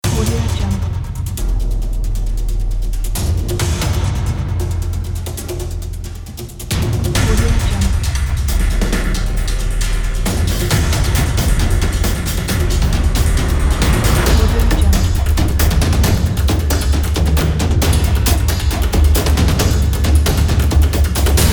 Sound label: epic tribal hybrid drums pack